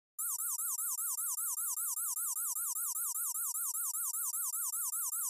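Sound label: Alarm Digital High Pitch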